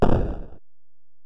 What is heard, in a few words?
EPerc, Alesis DM-PRO